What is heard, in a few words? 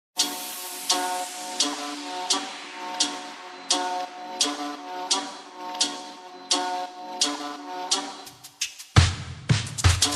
REMIX Slowed Reverb